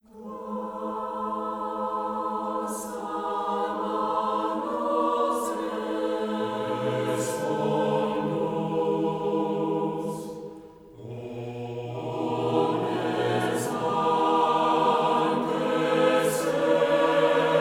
Choral Music a Cappella

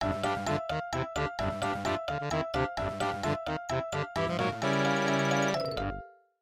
MIDI Animation stings

